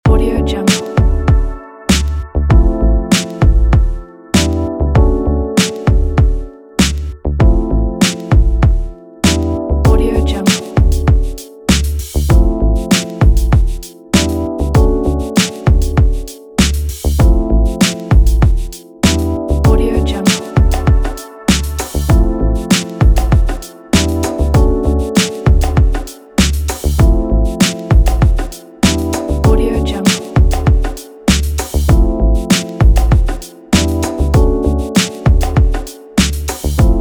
lounge beat

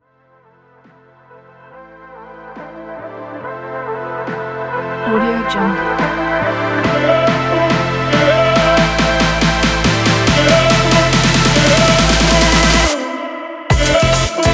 future beauty electronic

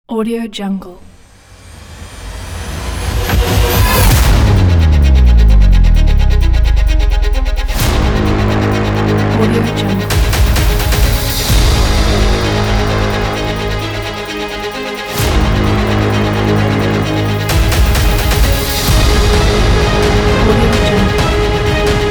epic blockbuster trailer

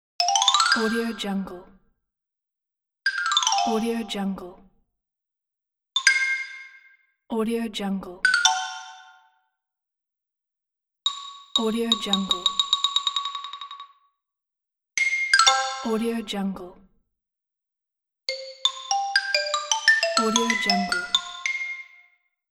cartoon sound

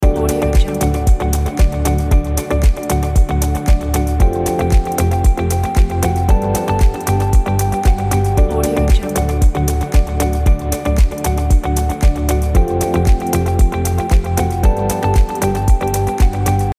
technology loop; hotloops

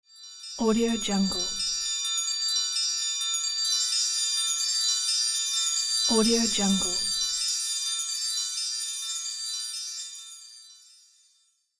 glittering holiday chimes

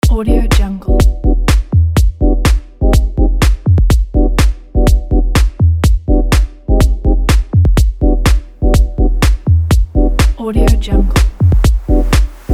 minimal fashion

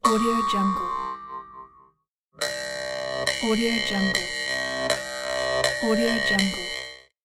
jew harp

